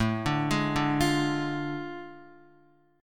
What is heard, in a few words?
A Major 7th Suspended 4th Sharp 5th, Guitar; AM7sus4#5; arpeggiated; JGuitar